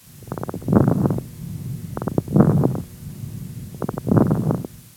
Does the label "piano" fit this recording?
No